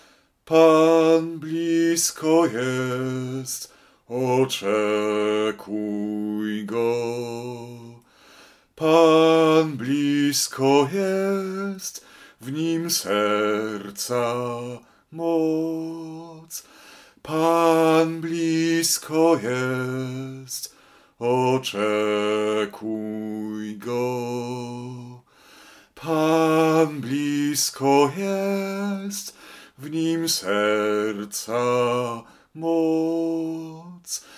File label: Bas; Pan Blisko jest; Taize